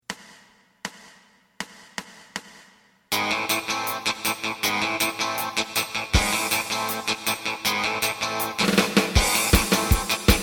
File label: bass